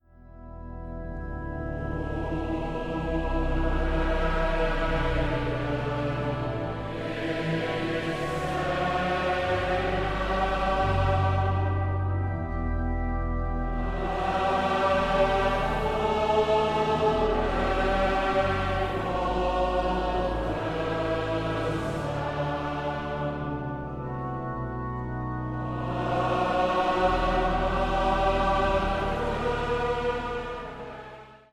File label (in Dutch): Kerstsamenzang Lofzang Maria